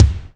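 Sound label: BD, bass drum; Best Service Gigapack 2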